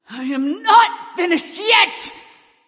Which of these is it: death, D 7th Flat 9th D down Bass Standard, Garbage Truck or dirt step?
death